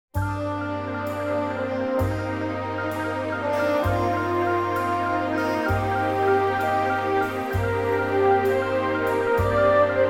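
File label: G, Backing Tracks for Male Vocals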